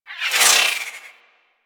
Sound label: Warfan Projectiles Whizzby; Warframe; Tenno; Broken Frame